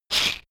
shaker wood rough hit double soft

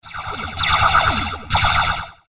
laser

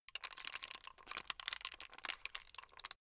plastic rattle slow

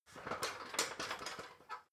ladder metal rattling

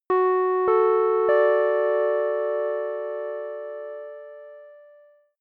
announcement keys major

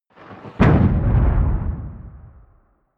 missile small fire stationary distant

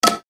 wood hit

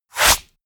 wipe

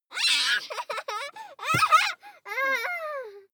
child laugh tickle easing

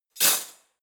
cutlery spoons drawer place hard

